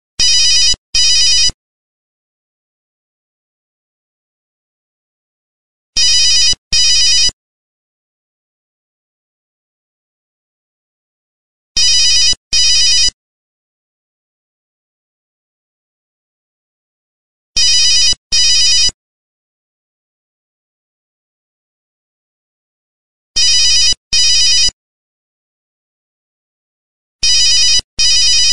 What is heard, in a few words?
original ring